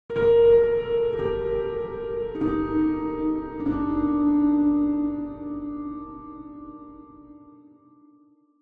Atmospheric Mystery Piano

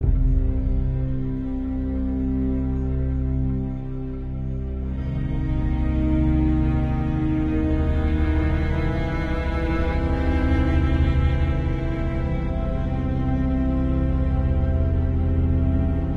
Loop Ambient; the walking dead season one

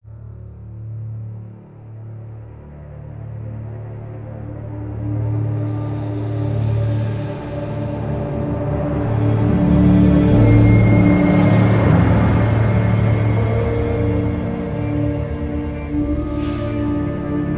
Dairy Barn Interior Lock Success; the walking dead michonne

